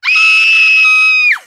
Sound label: pretty blood scream